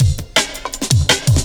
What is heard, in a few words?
Session Break, Breaks, Jungle; Blu Mar Ten - Jungle Jungle - 1989 to 1999 Samplepack